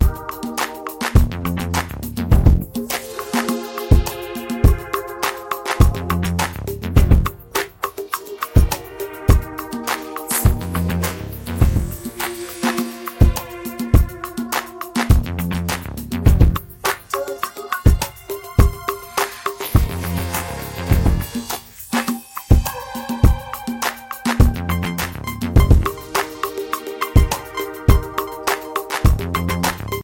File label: instrumental